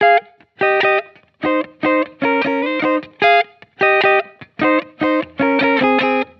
Electric Melody Loop 150 BPM F Min; Cymatics

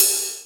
Ride; FLPRO

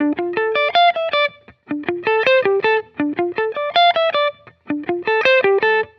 Electric Melody Loop - 160 BPM D Min; Cymatics

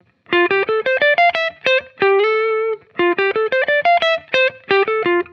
Electric Melody Loop 180 BPM E Maj; Cymatics

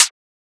Closed Hat

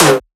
Perc, Percussion; FLPRO

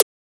Transient; FLPRO

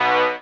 guitar

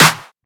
SnareClap; FLPRO

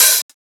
Open Hat; FLPRO

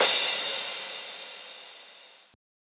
Crash

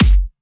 Kick; FLPRO

